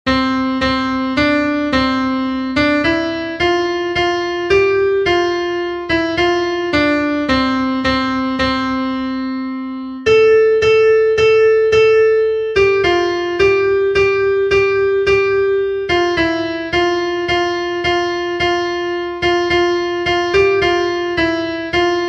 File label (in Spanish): CONTRALTO, himnos en piano; cantos del camino